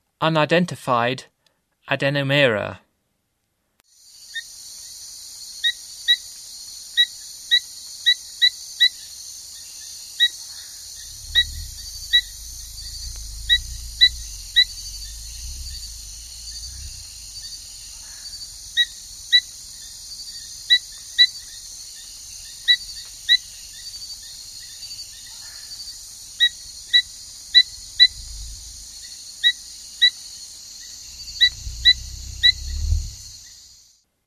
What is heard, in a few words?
Adenomera, Frogs of Ecuadorian Amazon; S. America